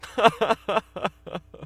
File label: sadness